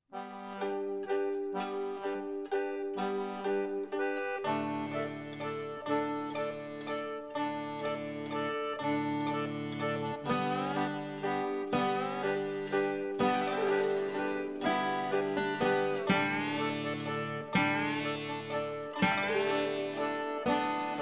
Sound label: Wind, Rain And Water; The Last Emperor